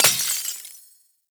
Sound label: Vial Ability Glass Shatter; Warframe; Warframes; Alchemist; Elements